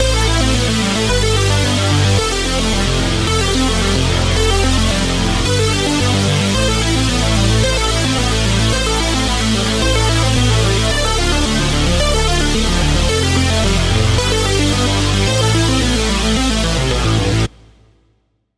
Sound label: Chord; Rich Army; Routed Sound; Serum 2 Expansion - Hyper Gamer Vol.1